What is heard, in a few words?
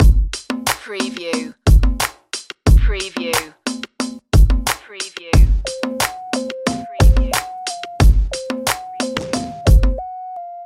bass loop